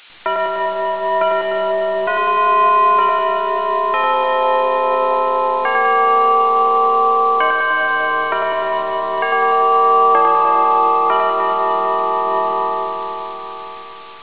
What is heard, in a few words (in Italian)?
campanile